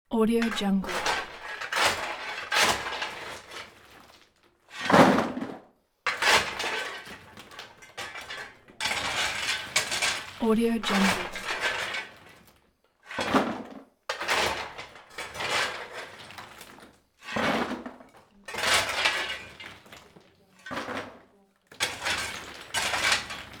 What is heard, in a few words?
loading coal to bucket